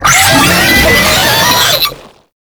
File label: Attack, Vocals; Enemies; Warframe; Proto Infested; Brachiolyst